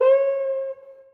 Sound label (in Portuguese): maquina bilhete som cuica; metrorio